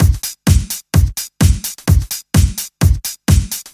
House Beat, 128 BPM

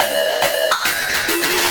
Fill 140 BPM

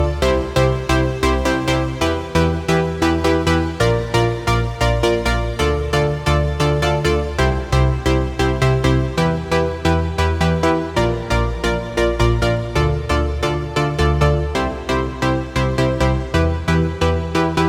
134BPM Pluck WET, Cmajor; Melody & Synth

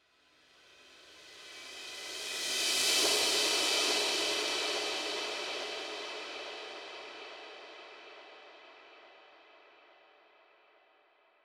Beast Kit 140BPM Reverse Fx; D minor; Sound FX; Vengeance Samples

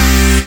Synth Bass; Synth Shots; Vengeance Samples

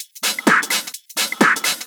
Electro Loop 128 BPM; Vengeance Samples

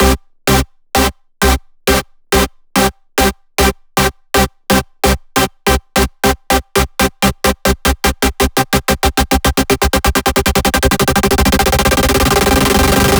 128BPM Accerlator Chord C, Melody Fills; 8Bars; Vengeance Samples

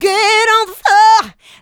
voc, female vox, Vocal House

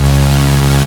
Synths C#; Vengeance Samples

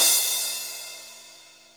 Cymbals crash; Vengeance Samples

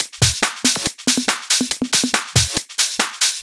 Loop 140 BPM; Vengeance Samples

